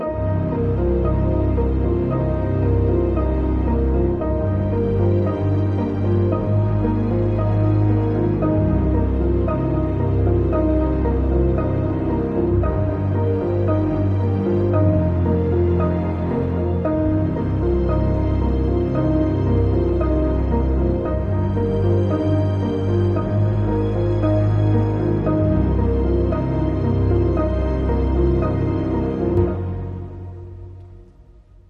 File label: Cold Ashes No Perc